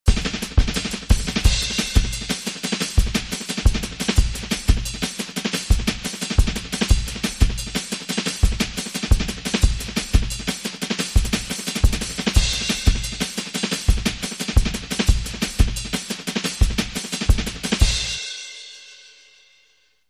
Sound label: Hip N Bass